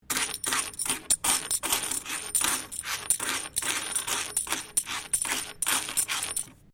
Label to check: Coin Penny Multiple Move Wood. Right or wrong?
Right